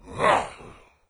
melee; doom3; commando

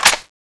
clip out; tfa cso; kh2002

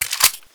clip out, weapons colt1911

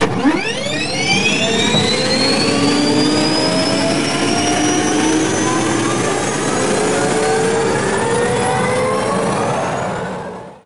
gen start

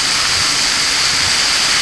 steam, gas

